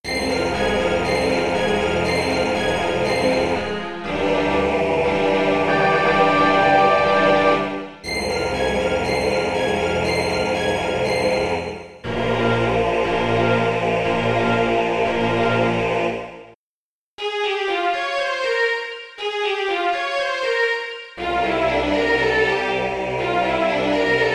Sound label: orch; ashoka